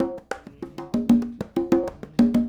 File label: Congas, 70s Analog Funk